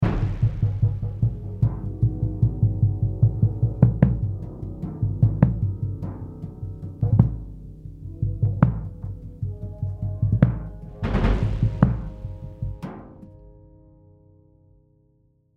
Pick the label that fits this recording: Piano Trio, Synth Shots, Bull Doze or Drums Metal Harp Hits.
Drums Metal Harp Hits